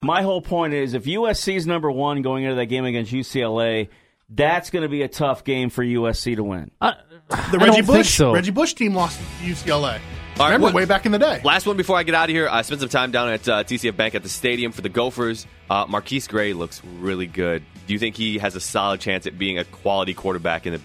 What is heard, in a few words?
wooooo